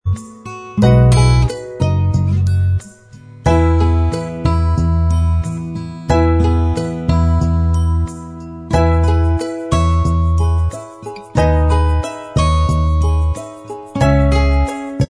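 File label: instrumental track, backing tracks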